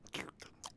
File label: Eat Vox; Civ4 warlords